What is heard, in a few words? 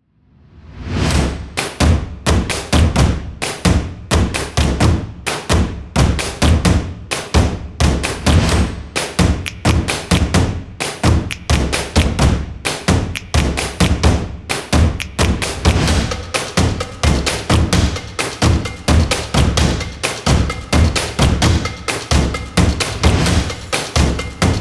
stock music